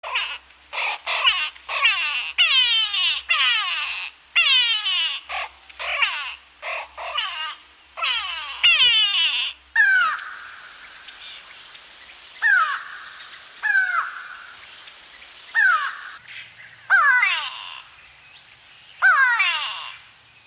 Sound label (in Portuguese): canto do turaco mascarado